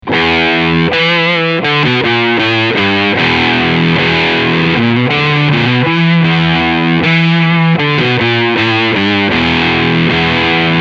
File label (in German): Full Gain EQ 3 Uhr